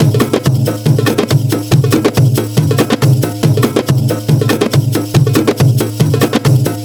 Drum Percu

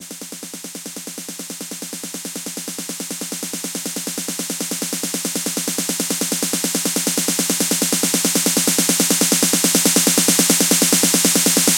Drum Fill In

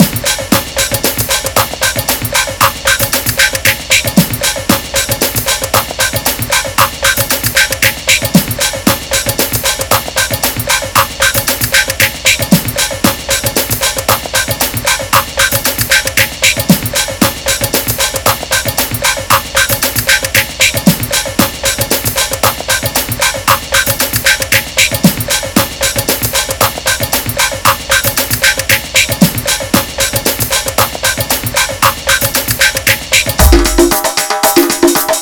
brazil drums

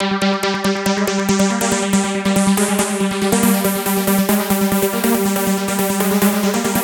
Synth Arp

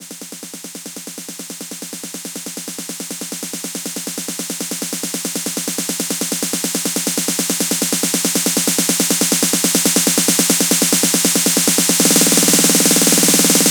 Drum Fill In

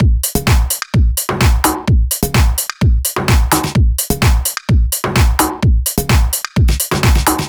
Drum Techno

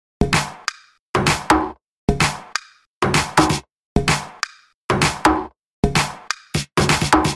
Drum Techno

